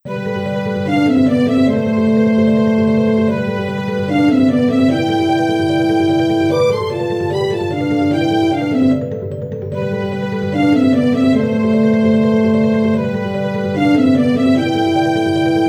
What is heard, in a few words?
upbeat dungeon